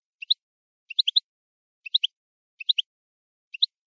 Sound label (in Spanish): toques de boli boli; campero